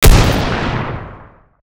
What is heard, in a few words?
b82 fire; STALKER